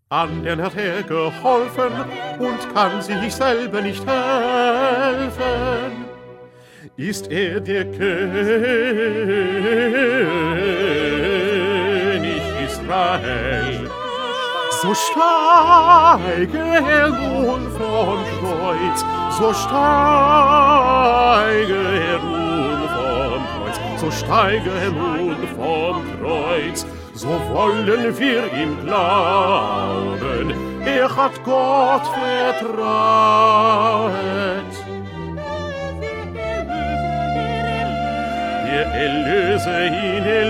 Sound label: 2nd Bass; Bach; St Matthew Passion